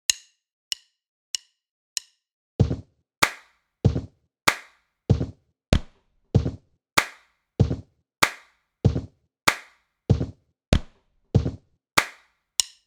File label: body perc